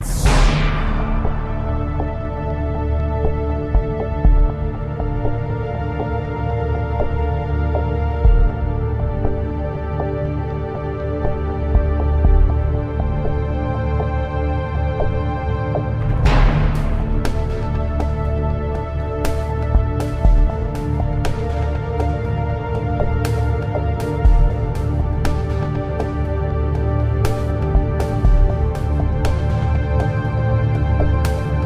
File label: Open Case Bed b key medium; Deal or No Deal; Wii